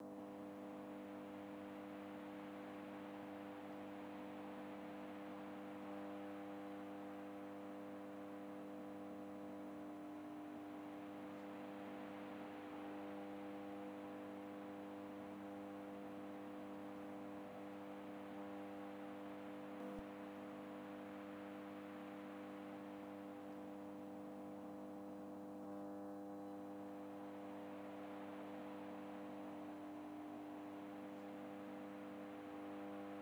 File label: truck ambience; seeding-planets